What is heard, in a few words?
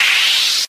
slash, Misc Percussion